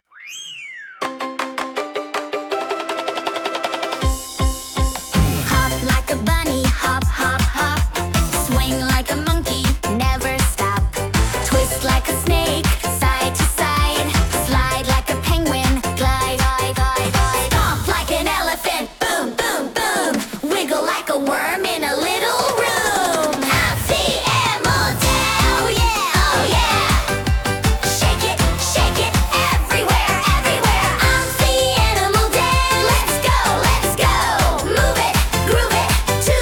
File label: Bouncy Animal Dance